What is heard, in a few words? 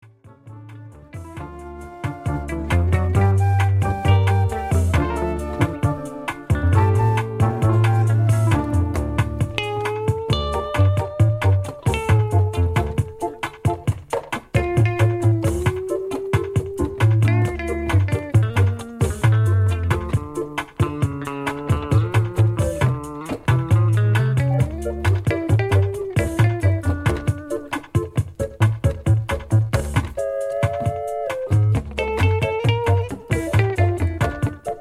Organ Orchestra